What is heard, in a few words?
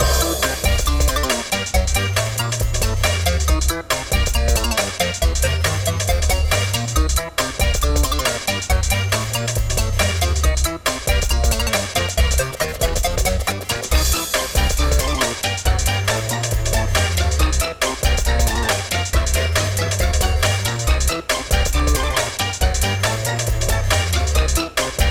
Unused Menu; Paper Mario - The Thousand-Year Door (GC DSP)